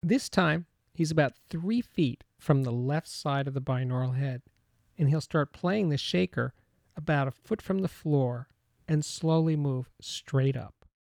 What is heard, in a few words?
Center Shaker Announcement; The Ultimate Headphone Demonstration Disc ( 24Bit192kHz ); Chesky Record